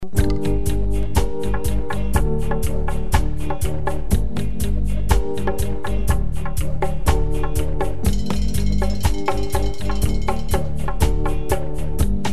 dub horns

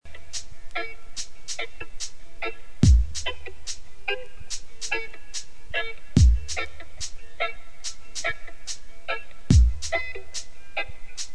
dubstep; dubstep remix

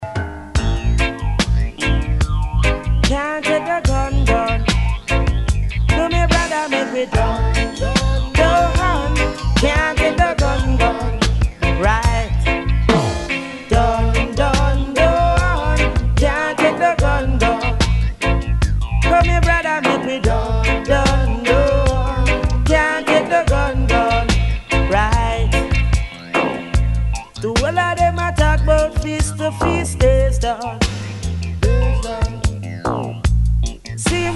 Dub

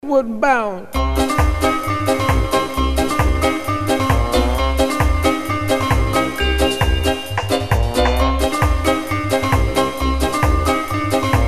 west bound ska

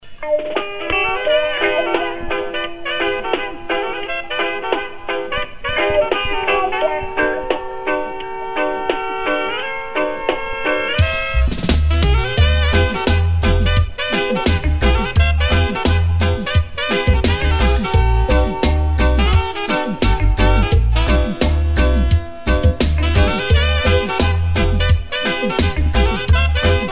yearning sax

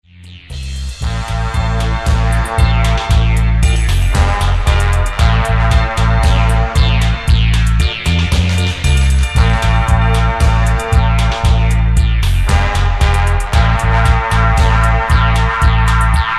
flute

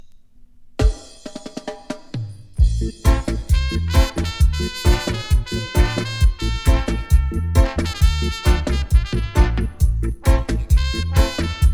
southwest horns

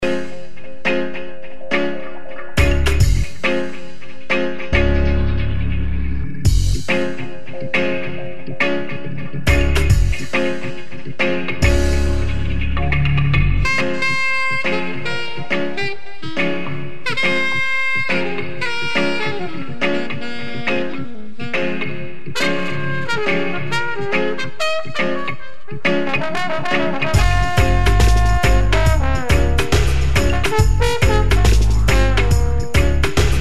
horns

